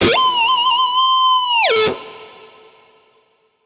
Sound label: GuitarFX; DTXFiles